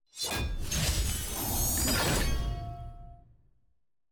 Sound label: celebrate ranked promote to gold; leagues